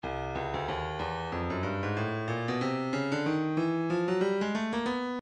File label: breakbeat midi 093 bpm; hipster d; Stylus Breakbeat Midi Files